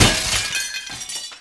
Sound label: break window